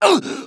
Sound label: death; mohaa; dialogue